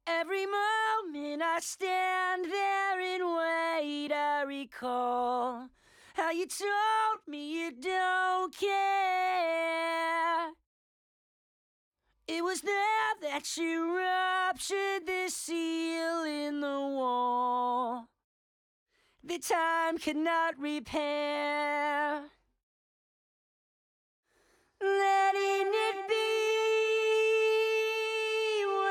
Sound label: main vox